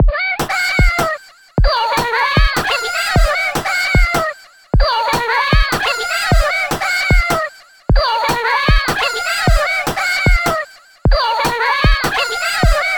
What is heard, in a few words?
screambeat